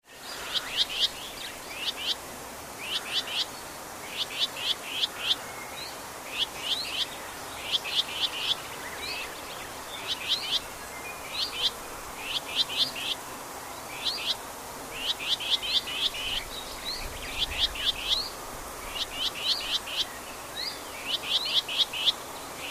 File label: martlesham heath whitethroat